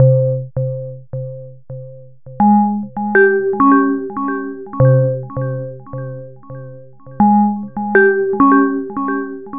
Bells 100

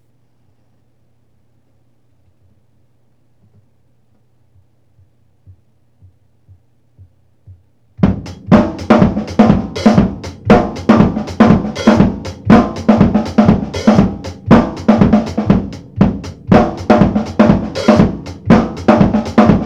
beat garage disco 120, acoustic

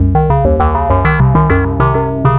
electro 100 loop

